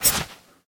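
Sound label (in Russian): свист от взмаха сабли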